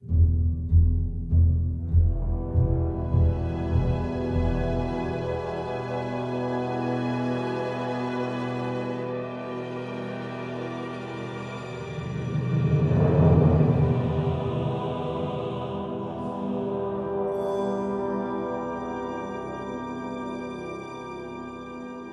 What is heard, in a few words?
cutscene music